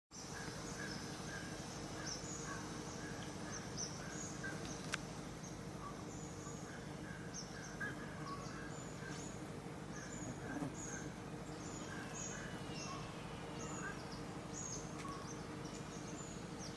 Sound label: buff faced pygmy parrot, New Britain Island Papua New Guinea; walindi resort